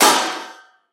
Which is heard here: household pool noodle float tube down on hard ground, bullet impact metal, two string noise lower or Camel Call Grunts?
bullet impact metal